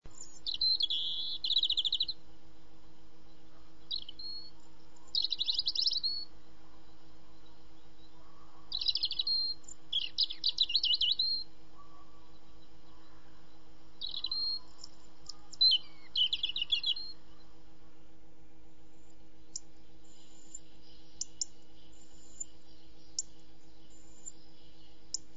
rotkehlchen

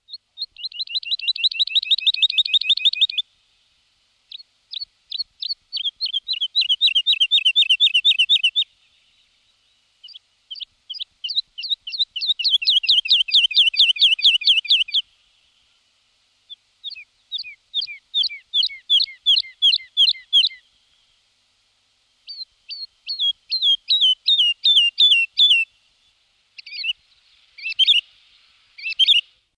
Heidelerche

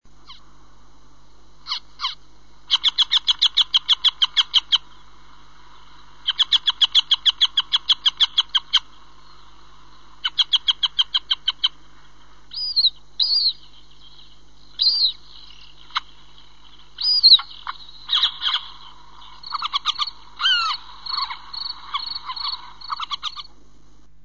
Wiesenweihe

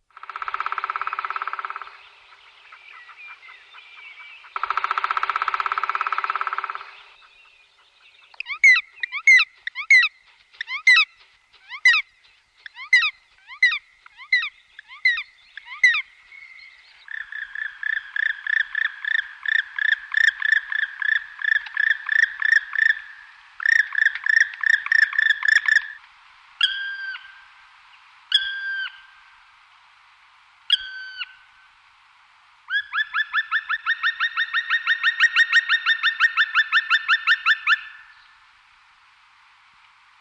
Schwarzspecht

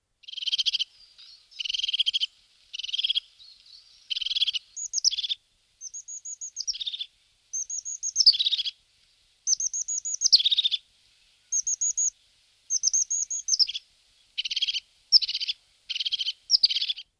Haubenmeise

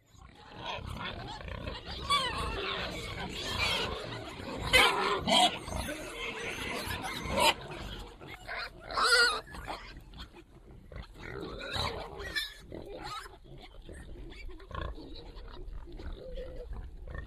wildschwein

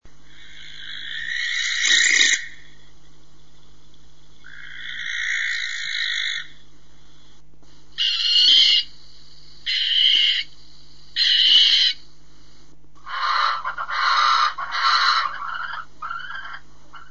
Schleiereule; greifvoegel

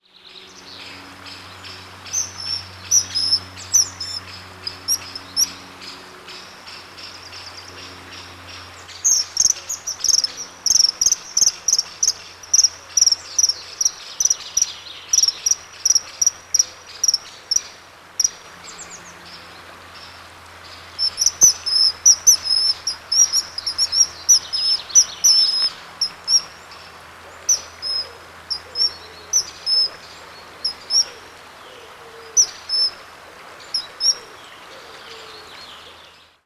eisvogel; Stefan Wehr

